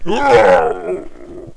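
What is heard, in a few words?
zombie attack; shamblie; npc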